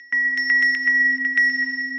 Bell Delay, Mallets

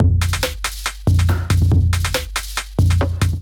Kit 140 bpm, drums; MIDI Clips; Fear Press Kit; Ableton; Factory Packs; Skitter and Step

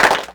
Clapbox Pitch, Clap Box

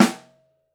SN Mono, Snare

